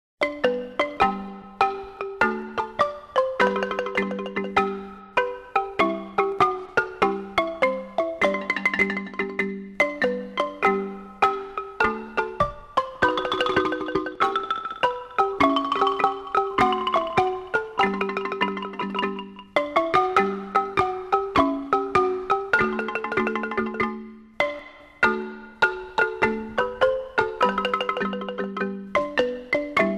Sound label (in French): hymne